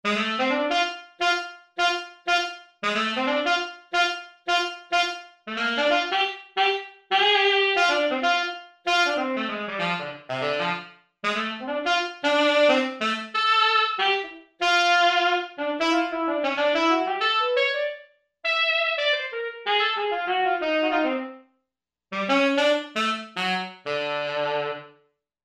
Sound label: Tenorroomm, Korg MSC-11S Brass